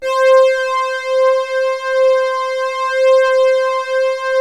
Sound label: C4, ANALO STRING; Sound Gallery - Charged